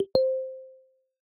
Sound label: Head Gestures Double Nod; iOS 26 Sounds